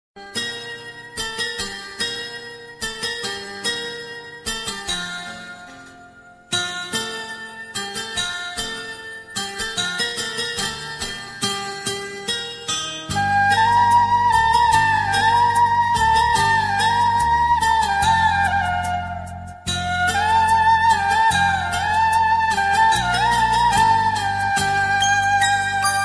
Maan Instrumental

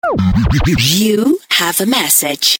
Nokia Message

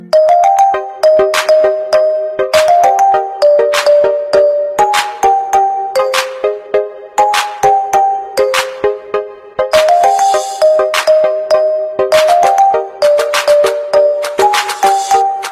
instrumental ringtone

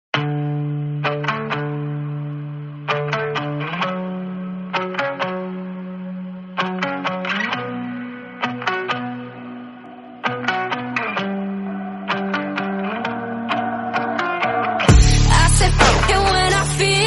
Instrumental

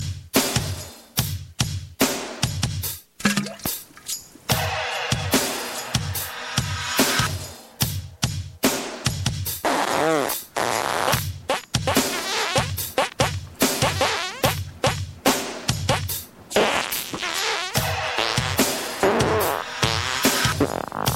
The Fart Song

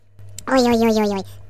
oyoyoyoy sms

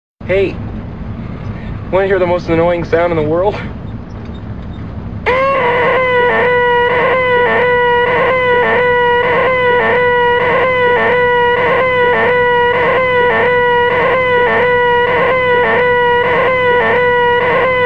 Annoying Sound

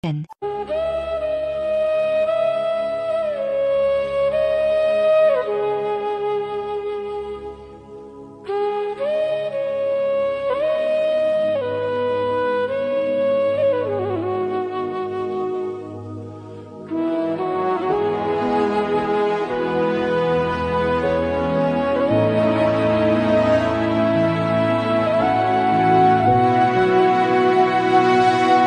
instrumental sad ringtone very sad

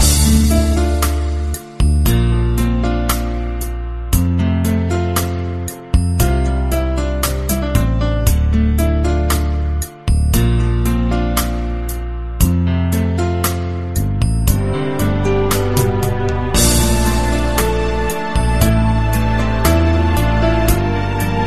Love Tune

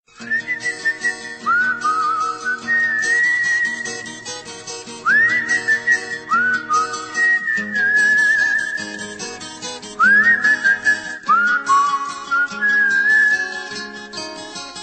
Soft Whistle Tone

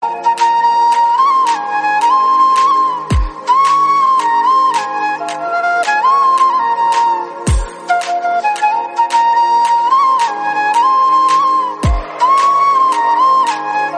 instrumental flute bgm; viruman

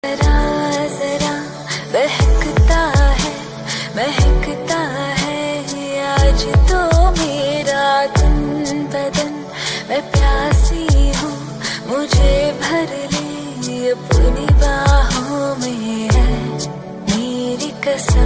female version ringtone

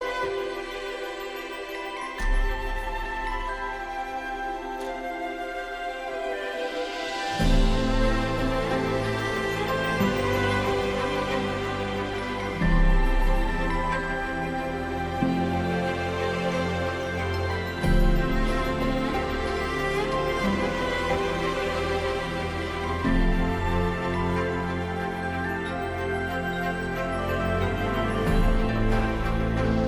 galaxy note 20 ultra 5g

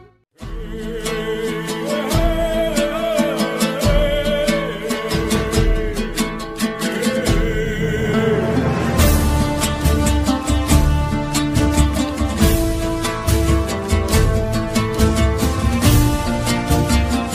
music ringtone

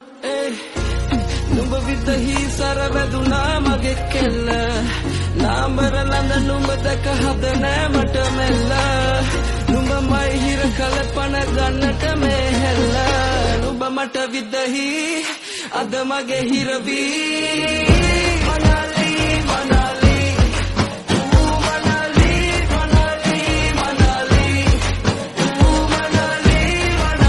Sinhala song ringtone

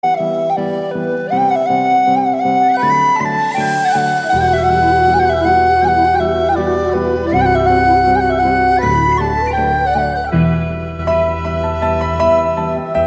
Flute; Tamil

